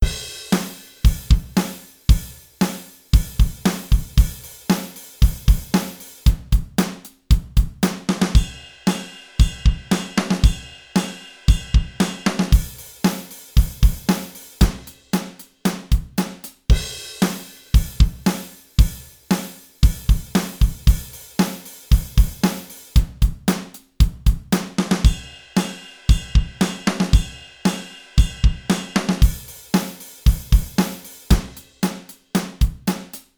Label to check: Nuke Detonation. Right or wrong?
Wrong